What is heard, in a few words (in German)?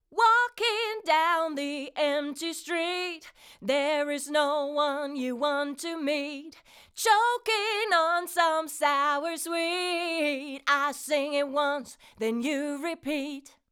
Vocal 2 zu 1 max. GR 4 dB; Golden Age Project Comp 554 MK II